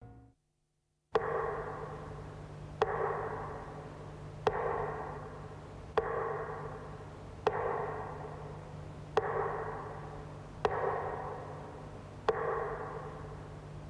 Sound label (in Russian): МИНУТА МОЛЧАНИЯ МЕТРОНОМ